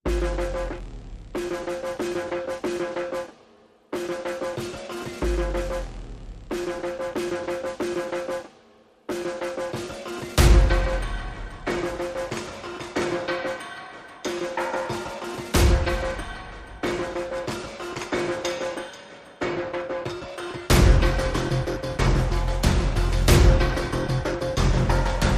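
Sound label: Percussion and Pulses Only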